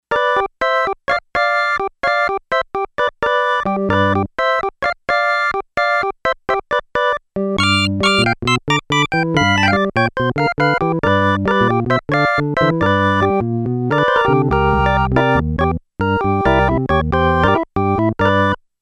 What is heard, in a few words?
E. Organ 3